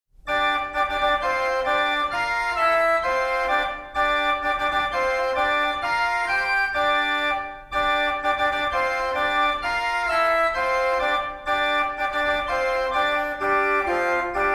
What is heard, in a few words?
Fanfare